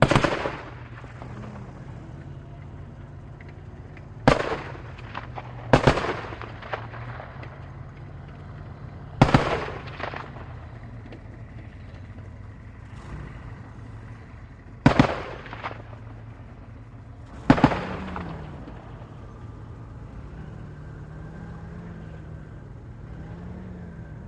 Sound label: Apc: Exterior Driving And Firing. 50 Caliber Machine Gun. Stereo; armored personnel carrier